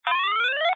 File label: clean, tetris; flutter tetris